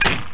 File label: trucks